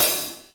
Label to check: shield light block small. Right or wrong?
Wrong